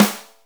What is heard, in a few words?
Snare, Akai MPC500